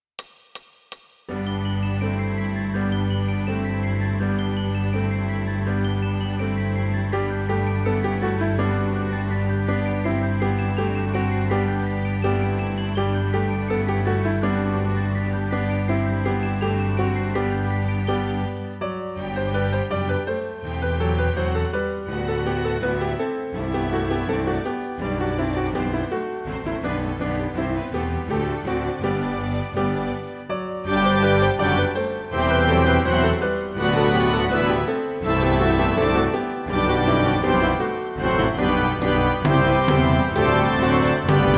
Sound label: Piano orchestra; Ding dong merrily on high